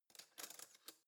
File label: cutlery spoon drawer place soft